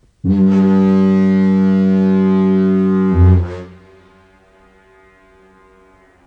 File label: Diaphone, foghorn; Cape Tormentine, NB